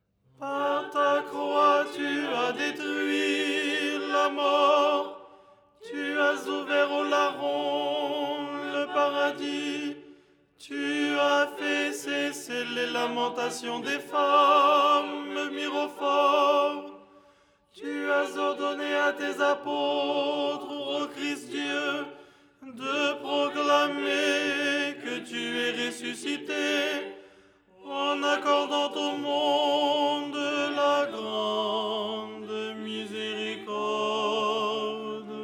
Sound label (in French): tenor; Les 8 tons en français; ton7; Tropaire du dimanche